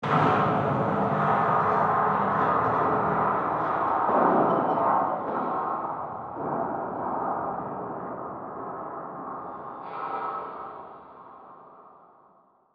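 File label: mega shipyard metal ambience; Stellaris; federations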